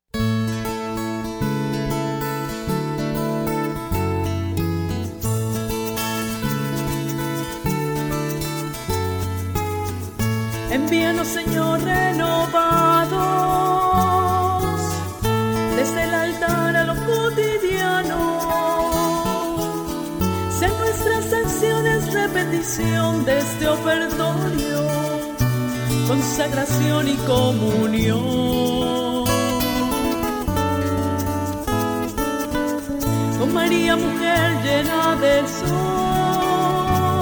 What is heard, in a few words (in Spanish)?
misa canto envio